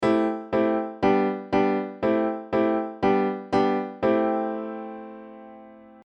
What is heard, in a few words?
Aeolian prog; Modal Harmony: An In-Depth How-To Guide